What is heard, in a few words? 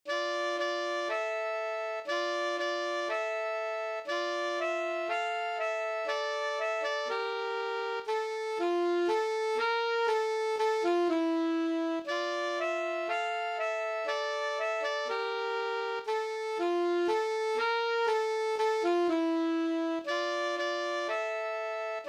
duet oboe; sakura